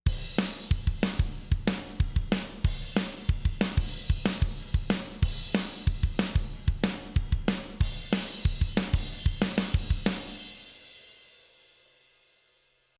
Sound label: drum off